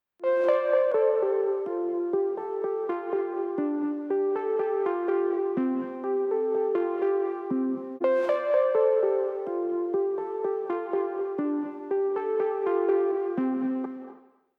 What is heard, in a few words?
LEAD